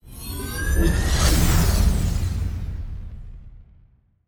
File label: ship colossus move; synthqueen; Stellaris; machineage